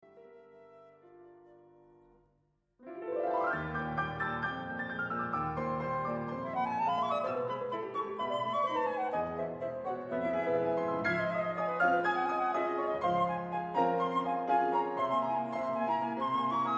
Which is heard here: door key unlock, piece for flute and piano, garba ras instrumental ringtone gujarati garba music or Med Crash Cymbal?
piece for flute and piano